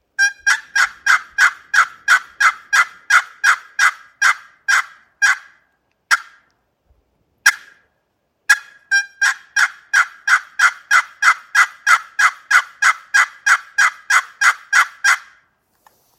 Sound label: Granadillo and Black Walnut, turkey calls; Figured Granadillo over Black Walnut